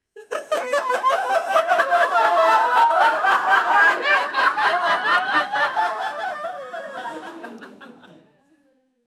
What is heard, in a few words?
group laughing; voices